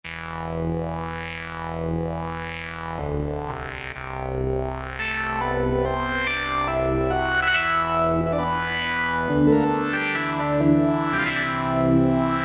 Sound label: Wah, Organs; Yamaha A4000; Piano - Keyboards